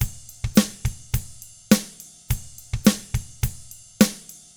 pop rock drums; 105POP ROCK

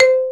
Marimba C4; Digital Sound Factory Virtuoso

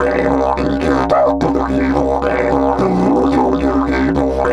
108, DIDGE 108BPM

